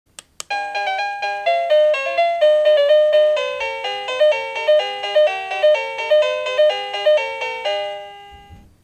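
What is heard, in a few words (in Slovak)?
Melódia k bezdrôtovému zvončeku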